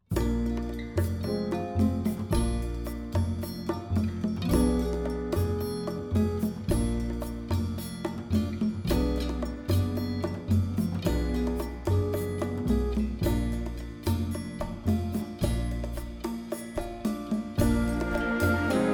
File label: rumba